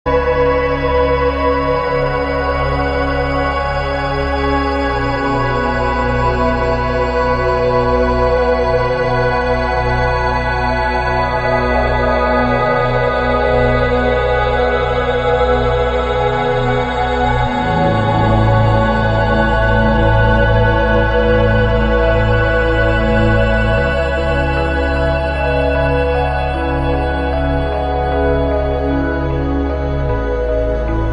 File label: ambient